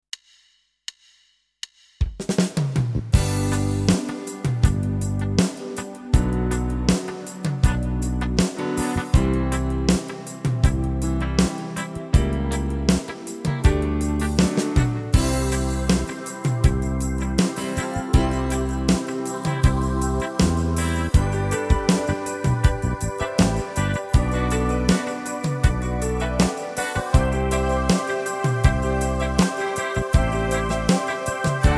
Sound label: Ballad, Tyros 2